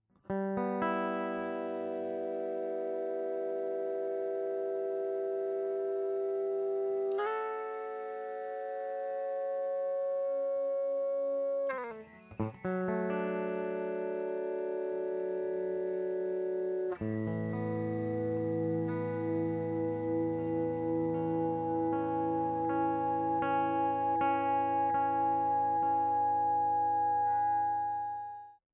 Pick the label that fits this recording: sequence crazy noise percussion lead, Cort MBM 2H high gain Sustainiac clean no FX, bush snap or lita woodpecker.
Cort MBM 2H high gain Sustainiac clean no FX